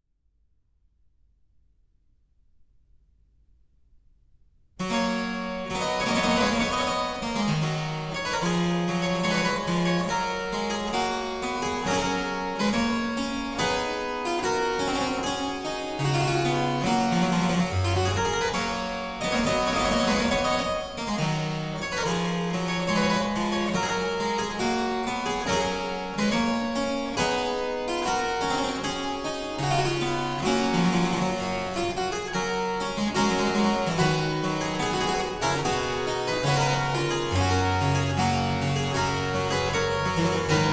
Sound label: Aria Variations in G Major, Harpsichord